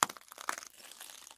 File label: glass; Pitch Black - A Detective's Demise